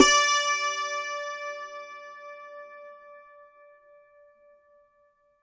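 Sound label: D5, dulcimer beat; SoundBytes; Early Patches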